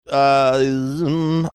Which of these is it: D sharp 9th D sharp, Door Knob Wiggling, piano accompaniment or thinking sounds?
thinking sounds